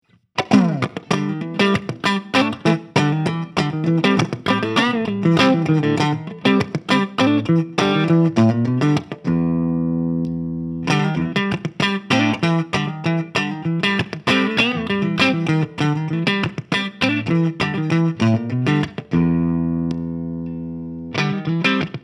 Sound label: Epiphone JC Clean; Bone White